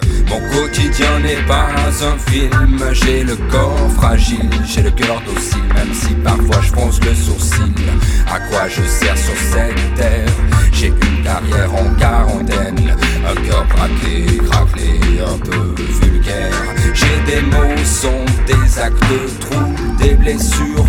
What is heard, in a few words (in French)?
Fugue en dub mineur